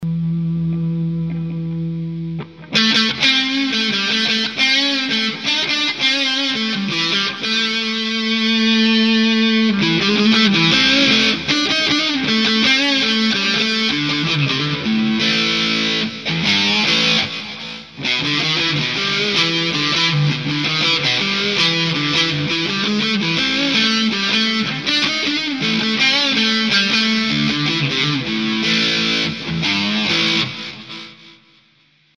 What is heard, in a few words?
Mitte; Neck/Bridge 3; humbucker